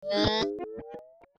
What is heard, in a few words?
warning